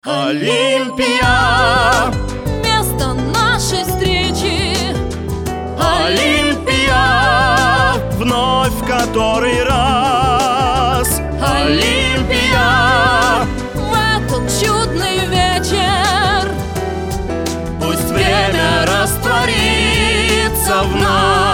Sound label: Vokal